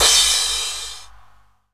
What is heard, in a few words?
CYM, Dance Drums